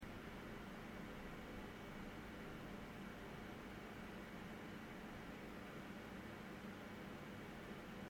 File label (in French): corsair a115 son; ventirad; test ventirad corsair a115